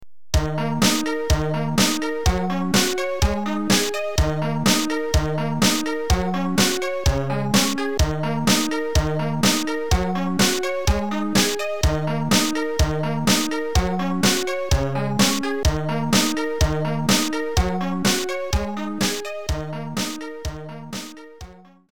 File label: bonus bgm; turrican